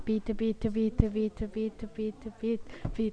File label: beat